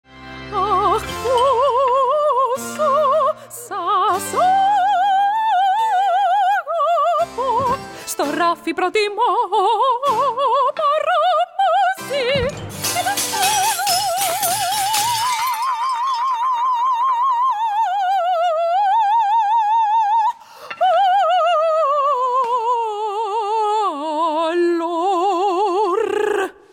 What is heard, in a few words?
Princess singing; China Princess; Legends of Oz Dorothys Return